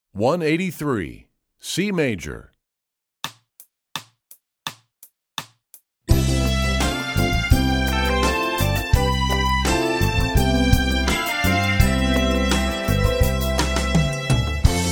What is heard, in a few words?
C Major, Strings; EE2000 Book2